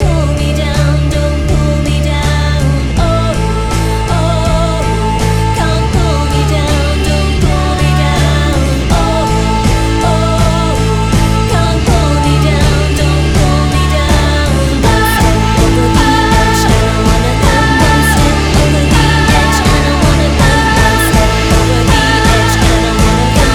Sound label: Mix Inv Gate In